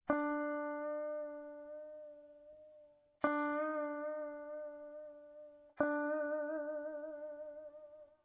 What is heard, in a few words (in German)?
vibrato